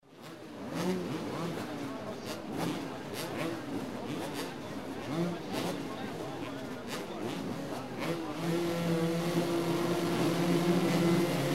Motocross, roar of engines, regassing, start of races; motorcycle racing